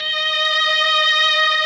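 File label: MELLOSTR, Mellotron Violins; Sonic Reality; Vintage Timetraveler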